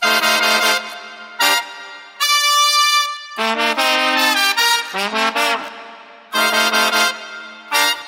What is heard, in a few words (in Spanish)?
VIENTOS; multitracks